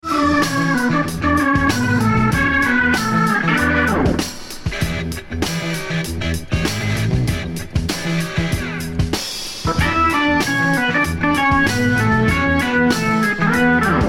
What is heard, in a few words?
Organ break